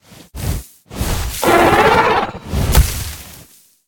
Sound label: creature pinnacarid sit up